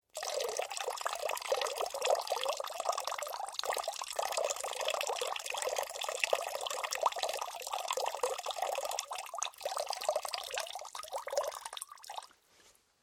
Water Trickle Long, Various water sounds recorded in a domestic pool; Nature; Foundation4